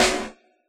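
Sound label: snare drum Elect., Yamaha DJX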